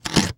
Technology Electronic Card Slide Credit Card Reader